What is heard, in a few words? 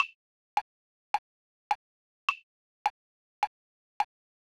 2-Bar Click